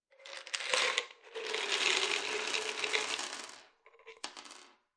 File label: Pills Spilled On Counter Large, Foley, Pills Spilled On Counter Top, Large Vitamin Pills; Click